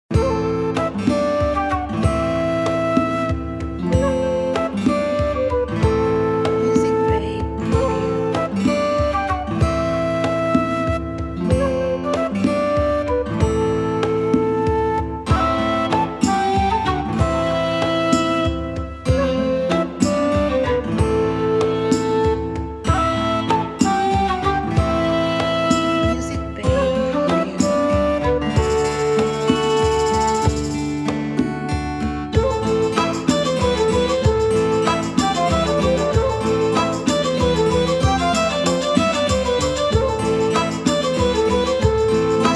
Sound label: Celtic Ballad